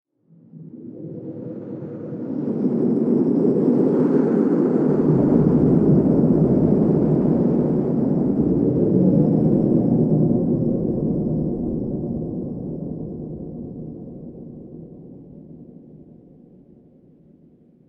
Alien Volcano Soundscape

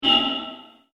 Space Psycho Strike, science fiction sound effect; star wars / trek style

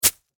Weather Snow Impact Ice Pick; Snow Sound Effects; Snow (Sound Effects:Weather); SFX Bible 6

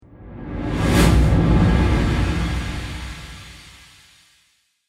Cinematic Reverse Whoosh; Whoosh Sound Effects

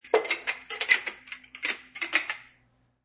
Multiple clinks of stacking glasses.; Glass Sound Effects; Bars

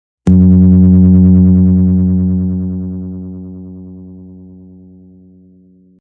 Bass Dong

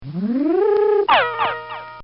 Cartoon Sound Effects Zip; Cartoon Sound Design

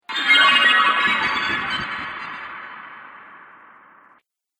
Fantasy Mage Spell, magic; Magic Sound Effects

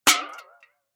Aluminum Can Half Full Hit Flick; Drops; Objects; Blastwave; Foley

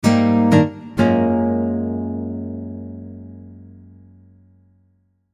Musical Acoustic Guitar Chords Syncopated Jazzy; Guitars; Blastwave

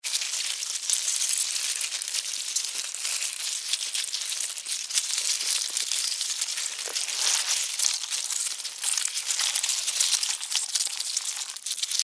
Insect Movement Group Wet; Animals; Insects

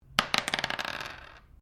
Dice medium pair laminate table throw; Variety of dice sounds; Casinos

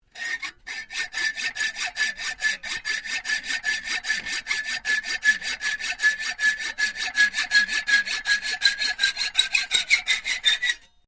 Hacksaw saw quick, domestic hacksaw; Machines

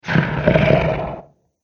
Dinosaur Creature; Dinosaur Sound Effects; animal

